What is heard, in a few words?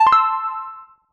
Chill Victory; UltimateFishing